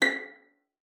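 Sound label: violin pizzicato B6; Xsample VOL-5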